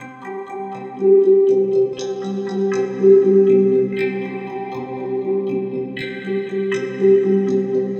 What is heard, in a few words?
Ice Age, Bells & Tines; Sonic Foundry; The Electronic Music Manuscript Collection